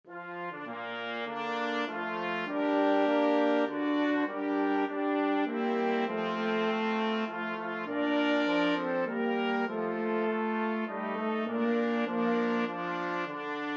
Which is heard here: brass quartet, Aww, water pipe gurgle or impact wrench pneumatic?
brass quartet